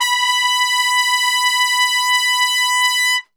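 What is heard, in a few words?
B 3 trumpet straight, brass; Best Service; Brass Super Section; TRP STRGHT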